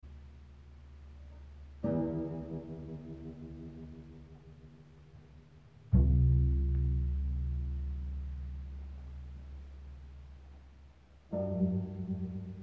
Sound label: Bells mystic; pyykkipoika